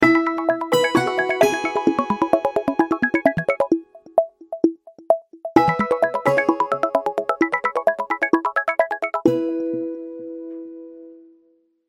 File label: ringtone, nokia; dione